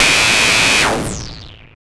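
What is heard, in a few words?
FX left, attacks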